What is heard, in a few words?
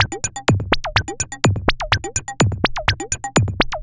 perc 125 bleeps, Percussive Loops 125 bpm; bleepsville